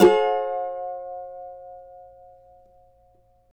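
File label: G minor, Guitars; Spectrasonics; Hans Zimmer Guitars VOL-2; CAVA CHORDS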